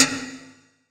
snarelike

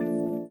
VIBRA; Spectrasonics; Metamorphosis

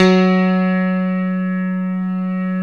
ELEC GRAND; OMI - Universe of Sounds

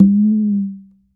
L CONGA, CONGA

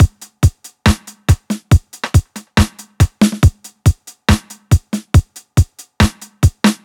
140 drum loops; RaggaDubba; M8; Electronisounds

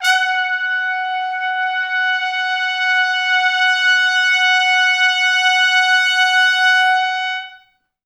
F#4 -L, Pop Brass; Best Service; ProSamples vol.19; AKAI

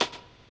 RAGGA; Spectrasonics; Metamorphosis; RAGGAVYNL

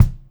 96 CL BD, Pop-Rock Drumloops; USB Soundscan vol.37; AKAI; 90 s Sample CDs

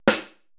80S DRUM SET

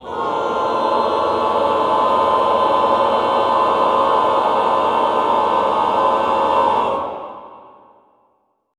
Cluster low, Classical Choir; Best Service; Extended Classical Choir

